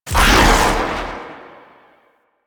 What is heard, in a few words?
Whirlwind Dash Cast; Warframe; Caliban